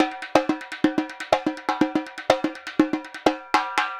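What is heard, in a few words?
repinique, DRUMS SAMBA; Best Service ProSamples vol.14; AKAI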